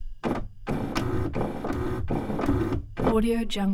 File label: robotic lifeforms servos source printer scanning servo move; robotic lifeforms 2